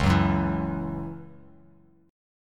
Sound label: Db Minor Double Flat 5th Db, Bass Standard; strummed; Dbmbb5; JGuitar